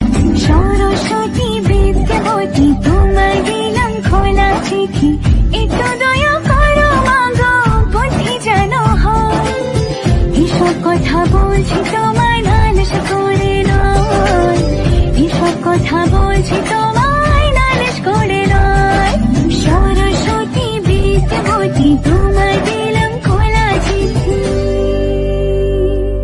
Bengali Ringtone

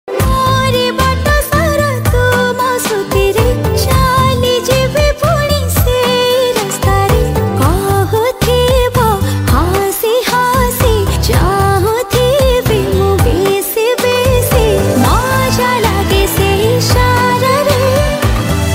Odia Ringtone

